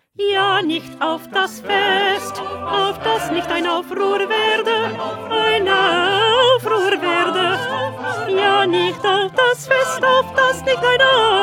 1st Alto

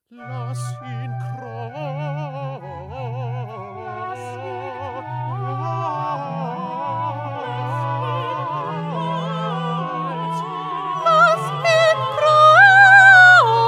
Soprano

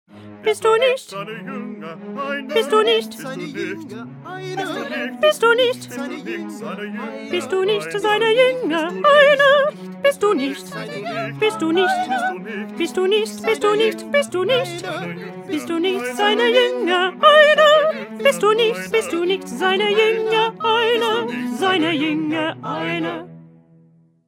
Alto; Bach; St John Passion

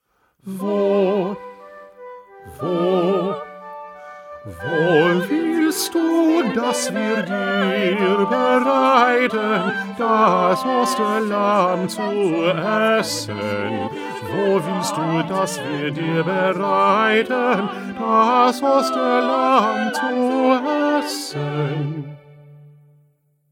Bass; Bach; St Matthew Passion

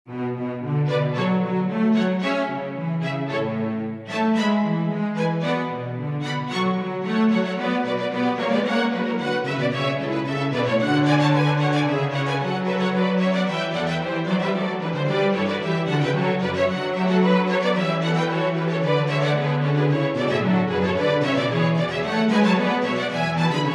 Instrumental; Bach; St John Passion

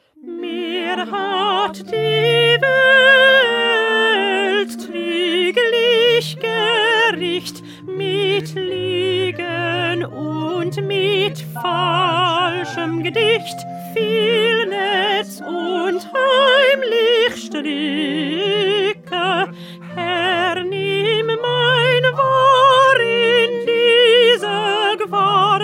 Alto; Bach; St Matthew Passion

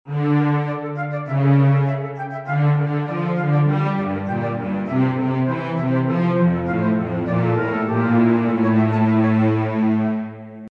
Instrumental; Bach; St John Passion

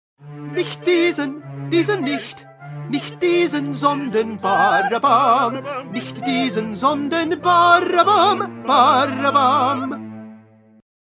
Tenor; Bach; St John Passion

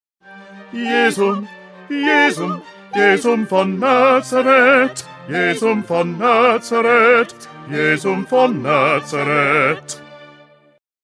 Bass; Bach; St John Passion

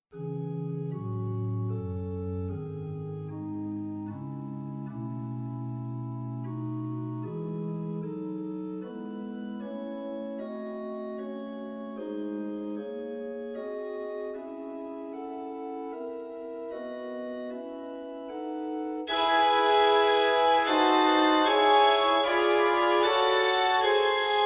Concert Version

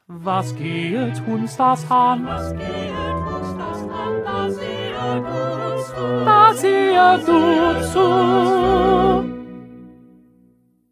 2nd Tenor

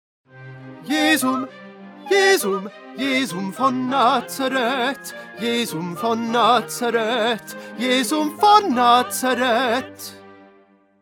Tenor; Bach; St John Passion

